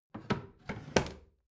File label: bathroom drawer open regular